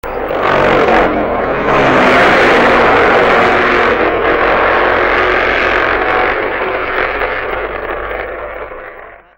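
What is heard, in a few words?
Triumph, motorcycle; 1968; Metise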